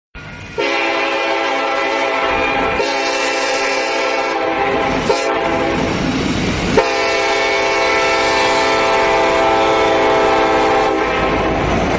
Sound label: train horn